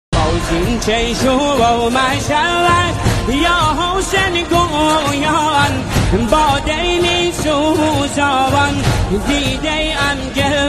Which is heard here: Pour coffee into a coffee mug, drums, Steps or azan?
azan